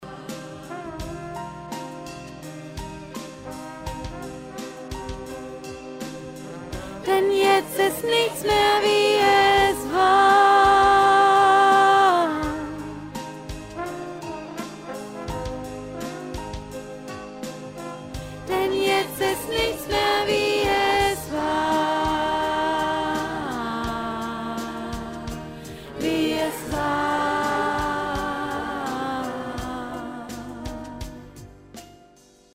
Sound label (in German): Chorstimme mittel; Die Musik-Insel